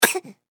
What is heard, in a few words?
Human Baby Cough; Baby Sound Effects; Babies (Sound Effects:Voice)